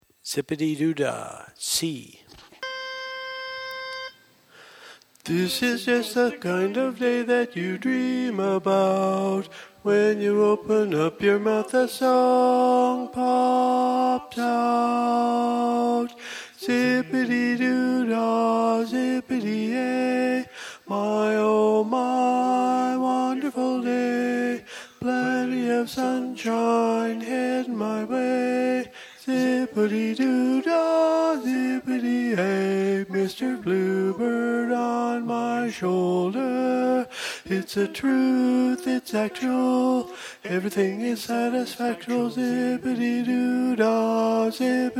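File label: Baritone, Learning Tracks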